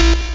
Laser A Misfire

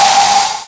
Coolant Dump

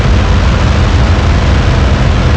Engine Accel

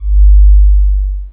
Collision

